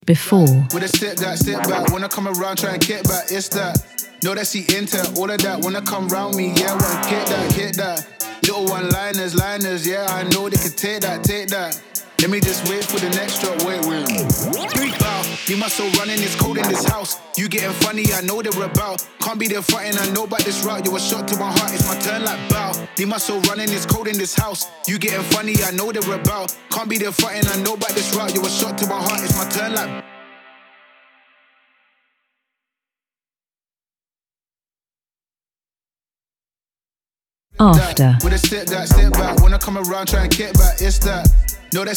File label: trap full